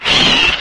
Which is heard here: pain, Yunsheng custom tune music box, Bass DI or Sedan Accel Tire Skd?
pain